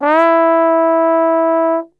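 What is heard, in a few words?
E 3 trombone glissando, Brass Super Section; Best Service; TRMB GLISS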